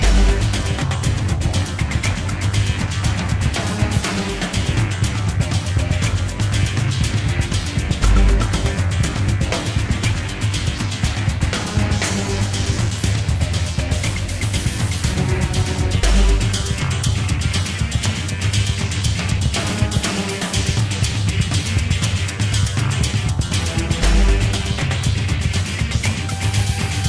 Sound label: Intense Loop; True Crime New York City; Xbox; Shared BigDaddy Intense Loop